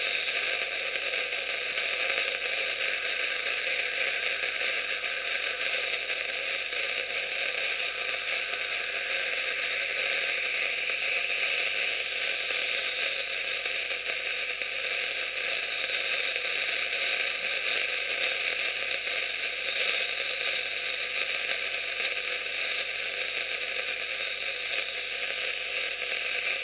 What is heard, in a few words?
walkie-talkie interfeerence; devices accessories utilities; NS Studios - ultra sound collection